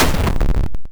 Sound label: hard, explosions